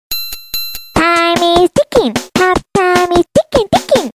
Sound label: Time Is Ticking